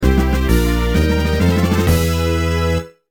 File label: Fanfare High Score; Ranger